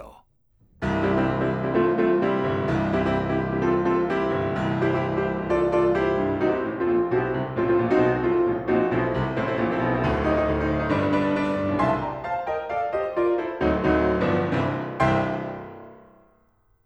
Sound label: Piano in the Middle of the Studio